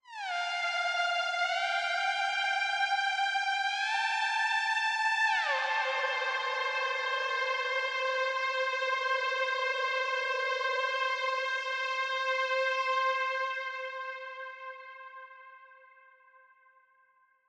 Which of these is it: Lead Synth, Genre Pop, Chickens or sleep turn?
Lead Synth